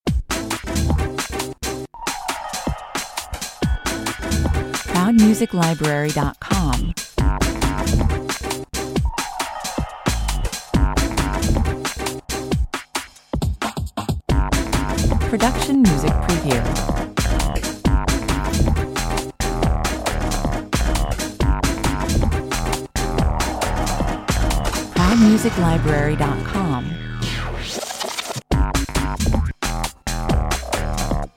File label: mechanix 135 bpm